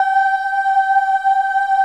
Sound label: G4 child ah, choir; USB Soundscan vol.28